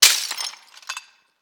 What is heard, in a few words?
Glass Shards Impact